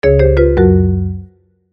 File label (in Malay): Kesan Bunyi Gagal